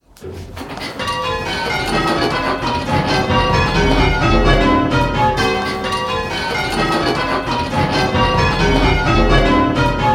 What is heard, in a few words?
Rounds 10, bells; Listening and Striking; hearing 10 bells; Pebworth